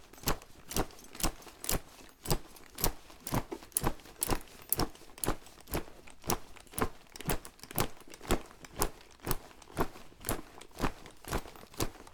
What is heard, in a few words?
army gear room walk; sonniss gdc